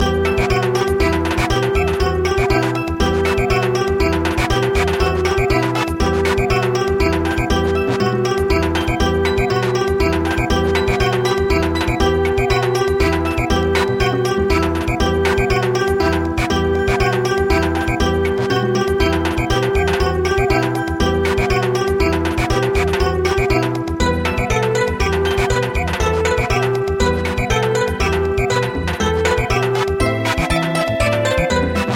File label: Samba; Carol of the Bells